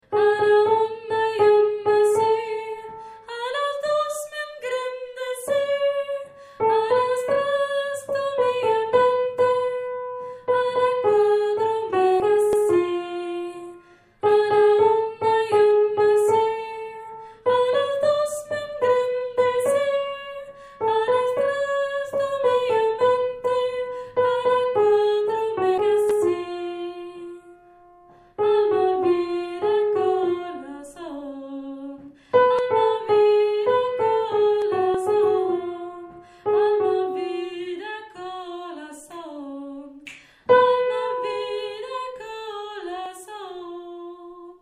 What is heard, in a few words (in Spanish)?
mezzo; a la una